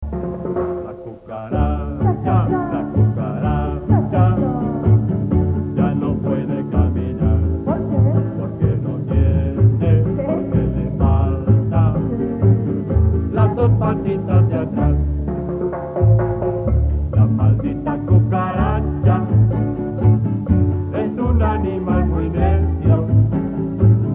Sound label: la cucaracha rumba; mexico